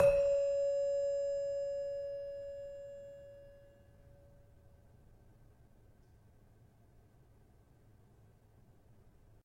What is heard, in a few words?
Demu C sharp 5, gravity harps tuned